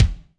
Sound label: Kik Fusion, Alesis D4, Drum Machines